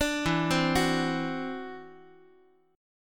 E 7th Suspended 2nd Sharp 5th E down Guitar 4A 4E 5A 5D 5G sharp 6C sharp; arpeggiated; chord; JGuitar